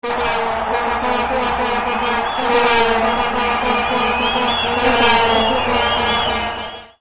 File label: Vuvuzela